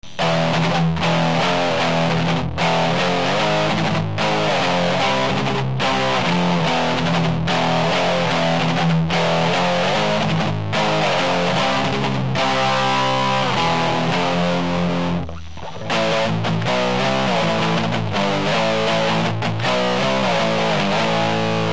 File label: metalcharger